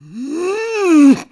Worried; Zelda

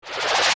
Alert; Beamos; zelda; OOT

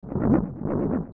Skulltula Shake; zelda; OOT

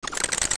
Keese Attack

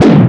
Dark Dive Throw; Ganondorf throwing his opponent after the Dark Dive

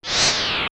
Fire Rod; Use the Fire Rod; LTTP

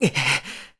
Link Carry Stamina Very Low; Link carrying a heavy object at very low stamina; zelda sounds; Skyward Sword

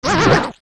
Hit; Bigocto; zelda; OOT

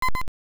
Low Health; Zelda

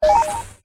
Portals On; Toggle Portals on the World Map

